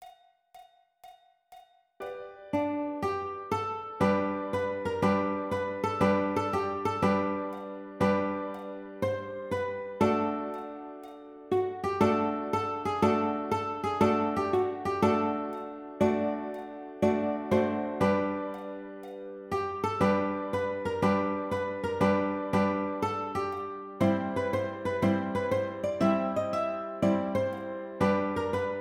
Ukulele

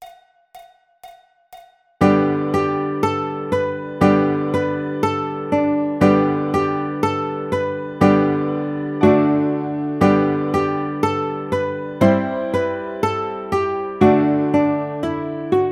Yankee Doodle Baritone, ukulele; Jolly Roger Ukulele Book 1

